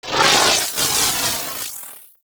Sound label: Crewship Missile Swarm; Crew Ships; Vehicles; Weapons; Warframe; RailJack; Corpus Crew Ship